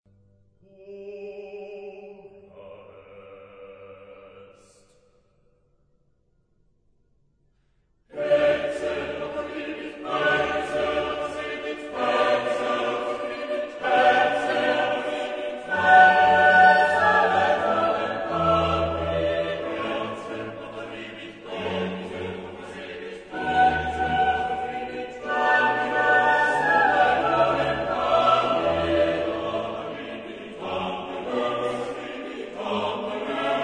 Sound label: 16 voices, chorus; Mendelssohn; Hora Est